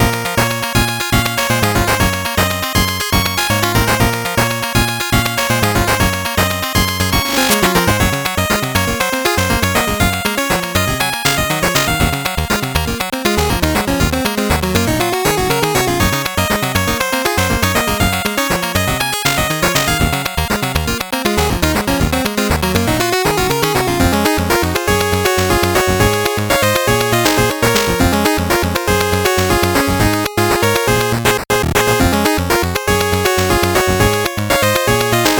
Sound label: 8bit bgm slow